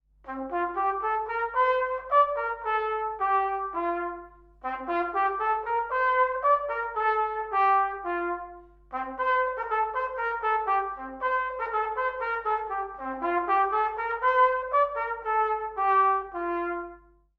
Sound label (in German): Sound Trompete; blaeserjugend; musikmentoren